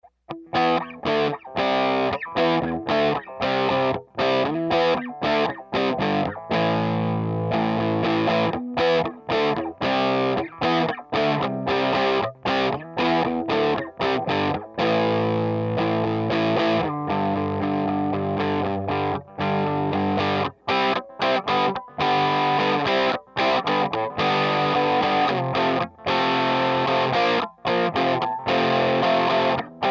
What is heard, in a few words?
plexi crunch